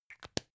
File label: plastic spray can small close short